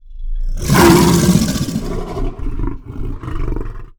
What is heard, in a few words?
lion howl